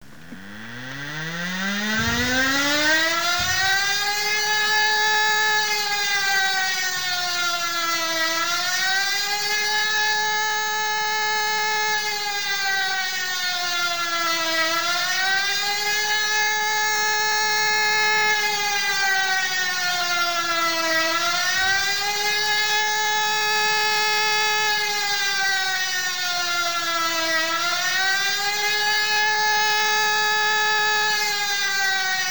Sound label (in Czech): zvuk sireny vseobecna vystraha